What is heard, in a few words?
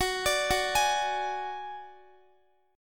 Gb Suspended 2nd Sharp 5th, Guitar; Gbsus2#5 arpeggiated; x,x,18,19,18,16; 4F,4A,5C,5G,5C,6E